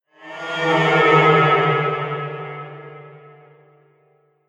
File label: Ghost, horror sound effect; Andrew Fleming